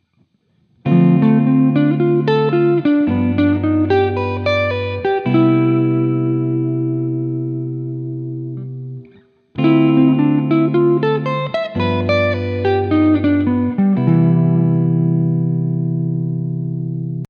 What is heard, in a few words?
guitar